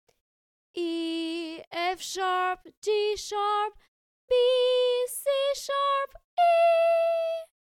sung scale with real notes; Solfa Scale for Melody 3 in Real Notes

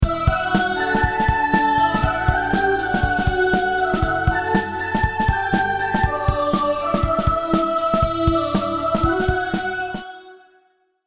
Full High; Rock Band

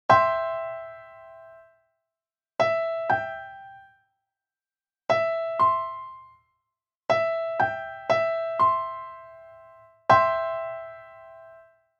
Major Triad First Inversion Intervals

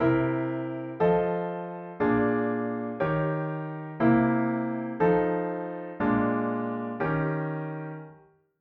Jazz Chords Dom7; Jazz Chords Made Easy

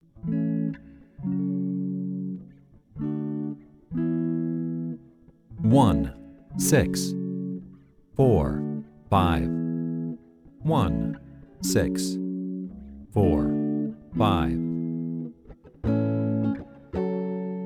I vi IV V Guitar A

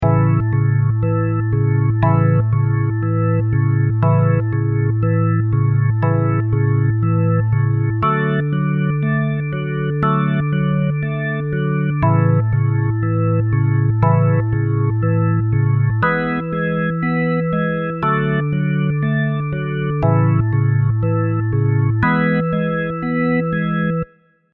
12 Bar Blues in A; chord ear training how to chord progressions

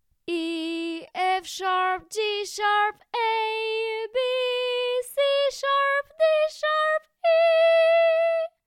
sung scale; The Noble Duke of York; Scale in Real Notes

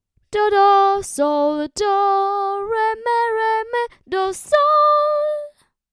the first phrase of the song solfa melody; The first phrase of "La marche des rois" as a solfa melody

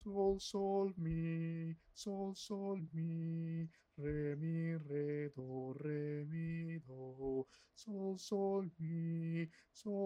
Lick Sung

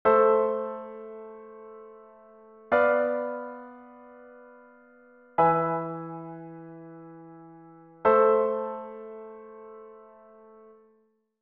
Minor Chord Progressions

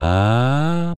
male vocal sweep up

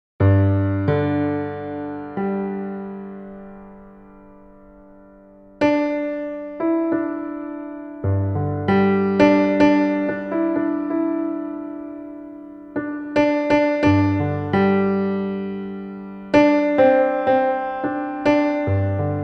Modal Improv; Three-note Chained Improv: C, D, E then A, B, C over a G drone

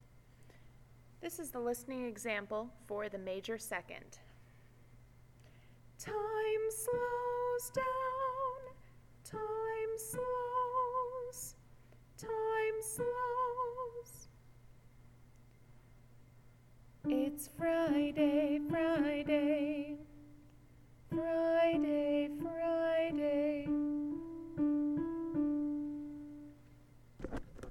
major 2nd

